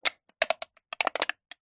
drink can empty crushed handling movements twists crinkle